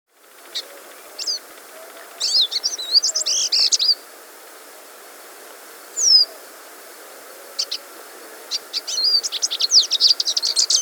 Olivaceous Siskin (Carduelis Olivacea), Andean Birds; S. America